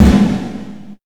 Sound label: Percussion; Vengeance Samples